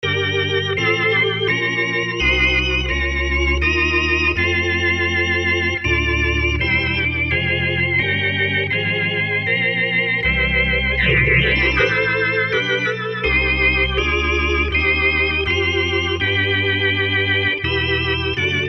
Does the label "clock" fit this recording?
No